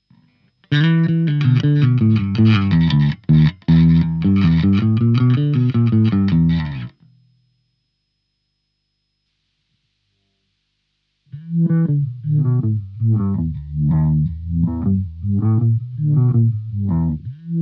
floating phrase

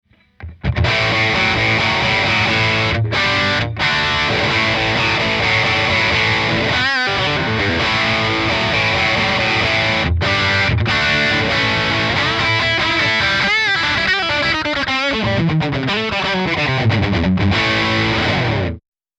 valeton omni ir dist

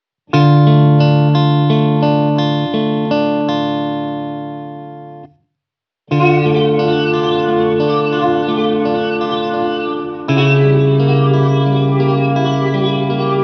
twist verb mono

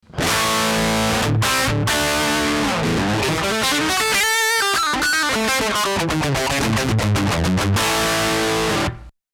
dry

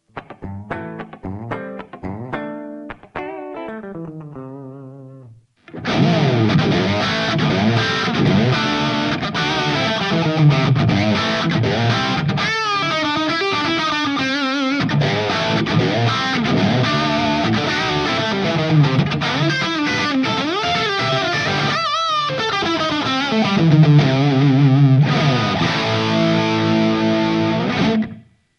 Marshell 800

